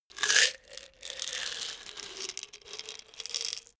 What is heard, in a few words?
pillbox turn